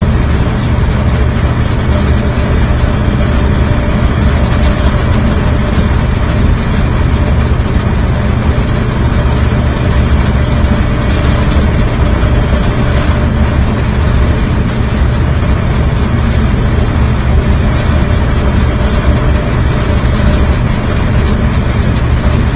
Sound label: vent rum, ambience; svencoop; aomdc